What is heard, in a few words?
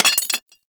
Rifle Mag Drop; Warframe; Weapons; Thanotech